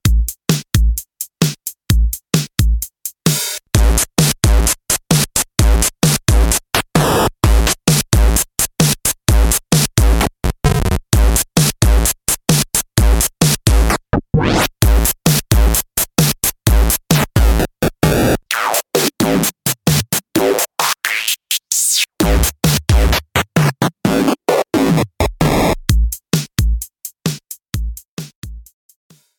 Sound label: Biscuit; OTOMachines